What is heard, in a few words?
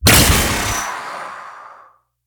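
gunlance, impact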